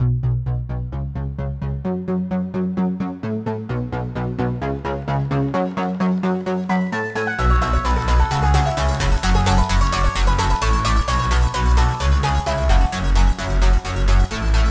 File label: Music Looped; Characters Themes; The track is for a character based on Raiden Sho